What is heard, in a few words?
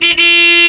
horn